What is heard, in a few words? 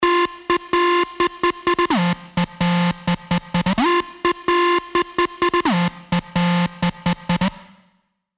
Crowd Control, Sylenth1 dirty dutch leads; revealed sylenth1 dirty dutch leads vol 1